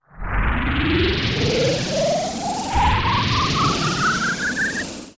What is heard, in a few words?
Phantom Ganon Portal; OOT